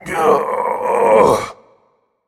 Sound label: grunt lift; smite; achilles